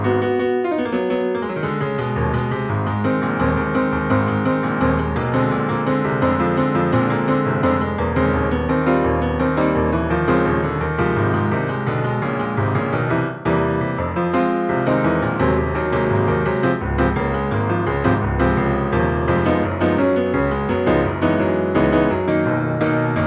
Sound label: piano accompaniment; audition